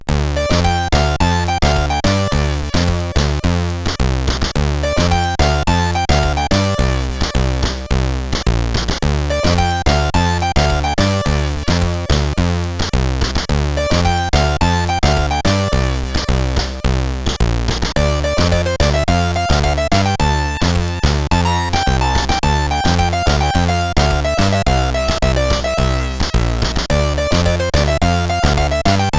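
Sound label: Lights On, MOS CSG8580R5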